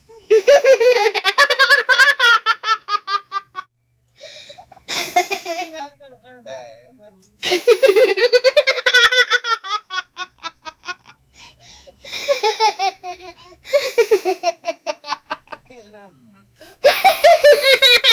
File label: 9 year old african, laughing